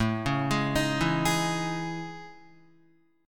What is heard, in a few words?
A Major Sharp 11th, Guitar; AM#11; arpeggiated; JGuitar